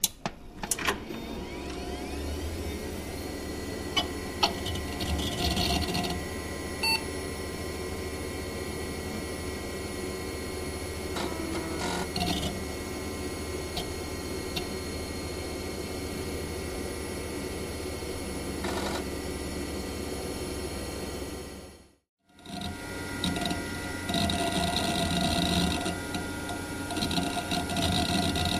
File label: Computer Pc Turn On Off